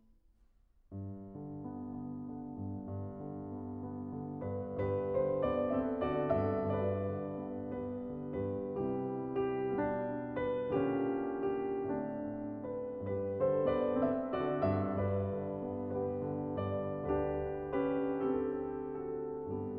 Piano; 25 Norwegian Folk Songs and Dances, Op. 17; Grieg

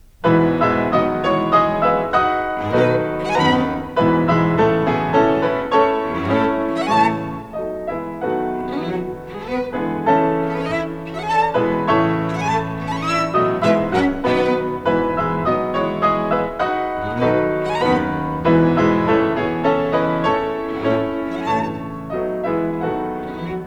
Piano Trio in A Minor, Cello; Op. 50 II. (h) Variation VII

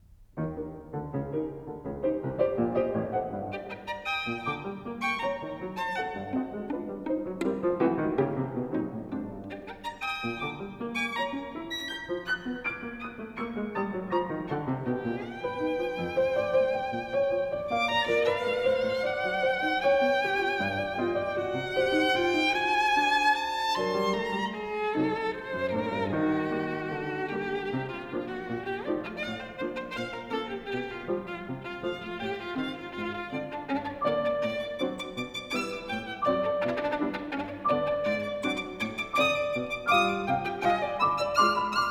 Divertimento, Pas de deux, Variation; Divertimento from the Fairy's Kiss IV. (b) Pas de deux. Variation (Arr. Dushkin for Violin and P

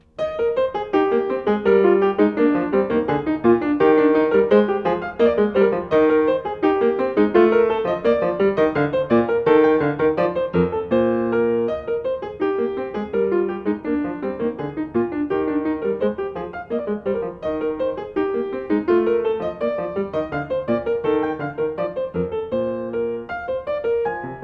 Gavotte; Glenn Gould; Bach The French Suites Nos. 1-4, BWV 812-815

